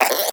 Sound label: Vocal Tranquilized Grunt; Warframe; Hunting Critters; Cryptilex